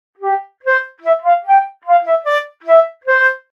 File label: baby Flute